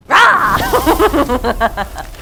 rawr; cassandra dimitrescu

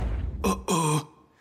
ah ah

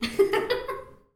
laugh; ddlc; monika

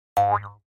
punches

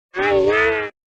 hit; mini pekka

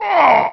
pain; griffey

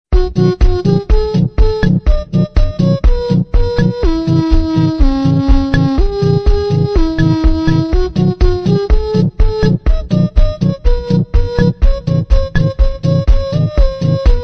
cat deep; maxwell

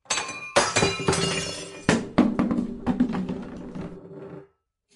crash

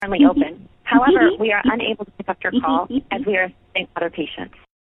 creepy laugh

